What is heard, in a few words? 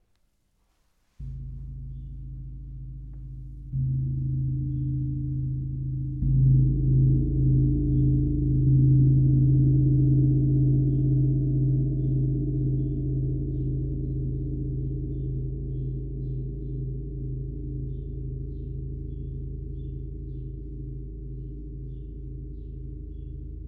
Gong